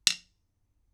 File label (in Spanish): Baqueta ancho, beats with different tools and materials